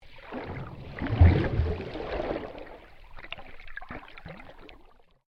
Water Waves On Rocks Submerged Medium, Waves recorded underwater near manly beach; Ocean; Nature